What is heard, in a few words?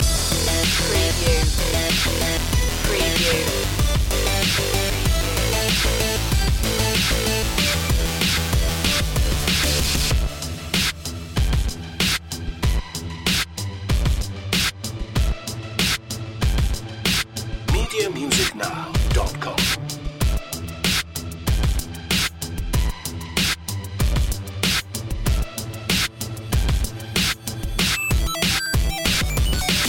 8-bit fat groove loop